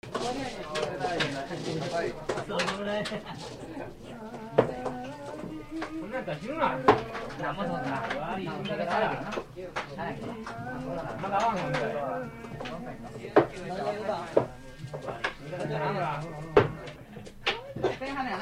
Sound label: asia mahjong crowd